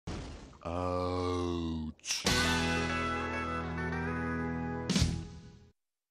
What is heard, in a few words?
ouuch; alaskan bull worm